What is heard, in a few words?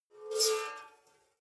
metal scrape rich fast